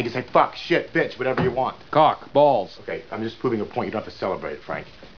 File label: earmuffs; svencoop